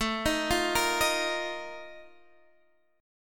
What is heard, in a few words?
Bb Major 7th Suspended 4th